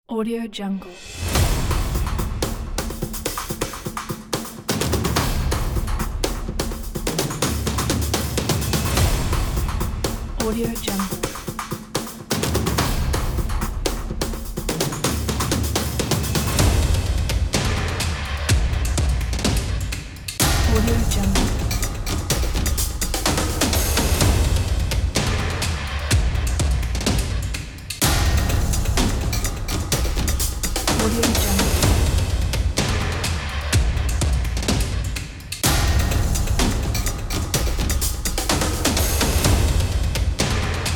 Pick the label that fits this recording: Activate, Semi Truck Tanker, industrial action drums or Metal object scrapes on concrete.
industrial action drums